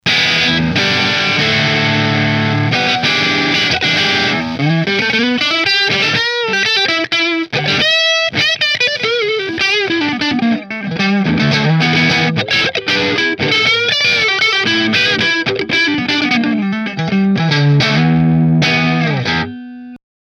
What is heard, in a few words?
Rahan Koa Neck Marshall, guitars